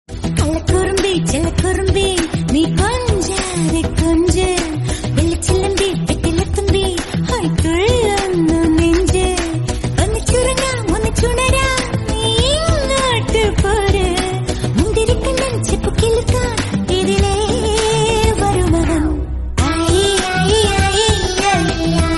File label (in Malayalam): malayalam